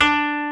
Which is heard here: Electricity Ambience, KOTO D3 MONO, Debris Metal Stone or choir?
KOTO D3 MONO